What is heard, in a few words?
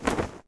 Cloth Move; Civ4 warlords